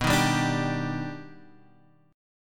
D sharp Augmented Major 7th B

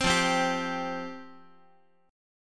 F Suspended 2nd F down Guitar; Fsus2 strummed; JGuitar

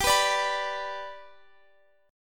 G Major down Guitar Open F; G strummed; JGuitar

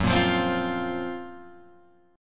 F sharp Major 7th Flat 5th F sharp, Guitar Open G

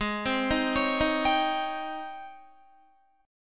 G sharp Major 11th G sharp, down, Guitar; JGuitar

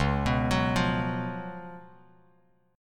Db 11th Db, Bass Standard; arpeggiated; JGuitar

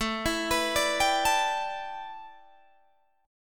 A 7th Suspended 2nd Suspended 4th Guitar DADGAD

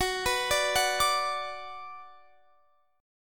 Gb Suspended 4th Sharp 5th Gb

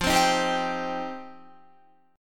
F 7th Suspended 2nd Guitar, chord; F7sus2; strummed; 12,15,15,12,12,15

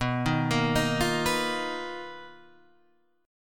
B Major Sharp 11th, Guitar, DADGAD

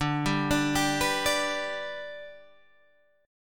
B-Minor Sharp 5th

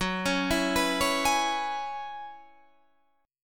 F sharp 9th Suspended 4th, Guitar, Standard; arpeggiated; capo 9; chordshape; 14,16,14,16,14,16

